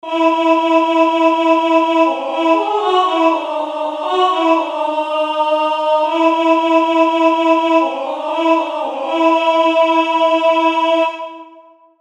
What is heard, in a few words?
alto